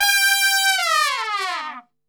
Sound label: G 3, Brass Super Section, TRP; TRP LNGFLL; Best Service